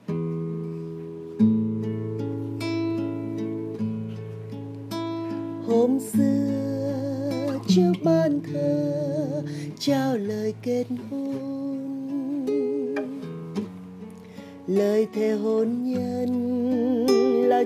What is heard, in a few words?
alto Basso